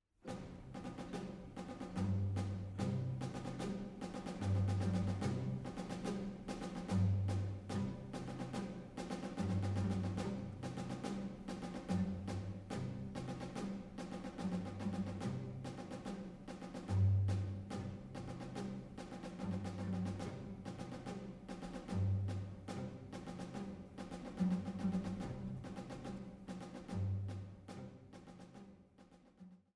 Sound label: piano